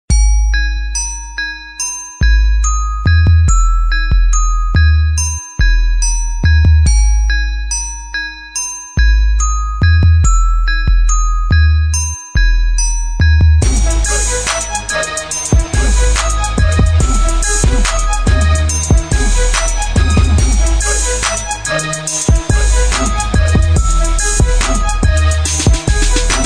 hip hop beat

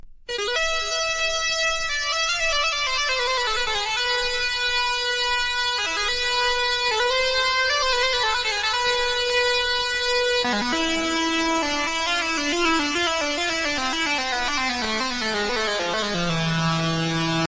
Eighties Disco

